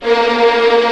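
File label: Vio tremolo A#2; Digital Sound Factory Virtuoso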